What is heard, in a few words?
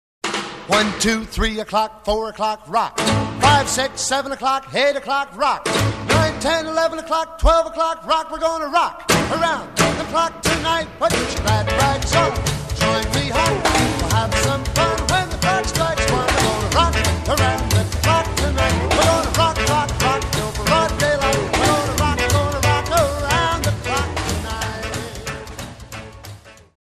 rock intro